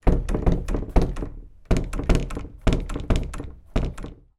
household door front already shut push check multiple